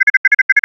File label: Arcade Chirp, Video Game Sounds; 1001 Sound Effects